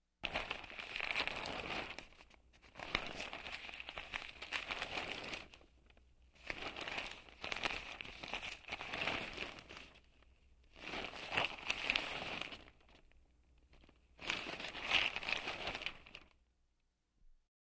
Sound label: Paper sorting